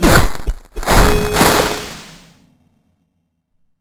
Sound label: pixel death sfx; fnf pico